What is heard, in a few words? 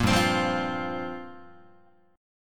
G Minor 11th G down Guitar; Gm11 strummed; JGuitar